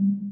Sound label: button rollover